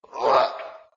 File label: Choke; Lewd SFX